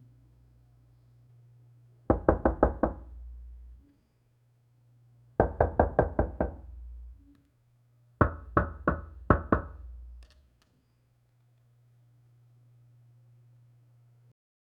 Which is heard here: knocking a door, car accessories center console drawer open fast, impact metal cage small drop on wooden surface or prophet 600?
knocking a door